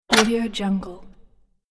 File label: metal blade whack